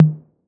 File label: TOMS 808; Wizoo - Platinum 24 Electronic; CHR TOMS 808